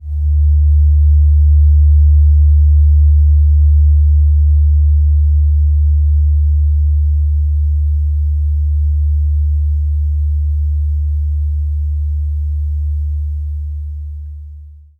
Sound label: fork Low; Saturn